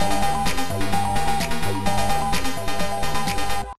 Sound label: theme loop clap skippy